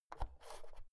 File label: oven knob press in